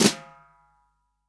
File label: SD, REAL DRUMS, SWING KIT